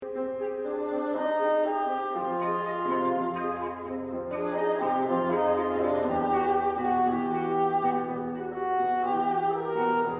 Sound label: Doppler fl bn pn